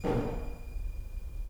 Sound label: tom tom